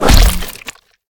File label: kick hit body; svencoop; rngremake